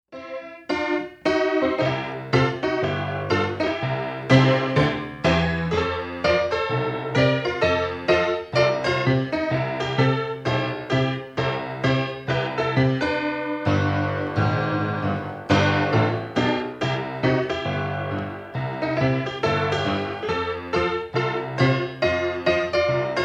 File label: upbeat